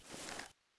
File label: Start Reload, F2000